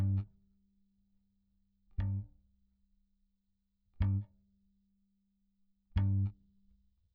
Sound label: bass